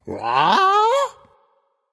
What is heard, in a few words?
nv confused; cupid